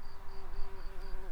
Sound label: bumblebees, Hypnorum workers